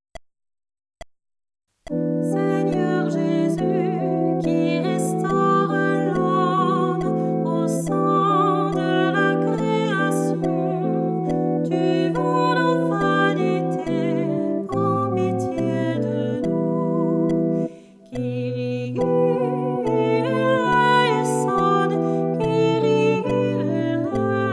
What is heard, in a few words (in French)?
Kyrie Basse; Laudato Si